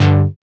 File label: SYN TON TE, dance synth; Sound & Vision - Gigapack I CD 2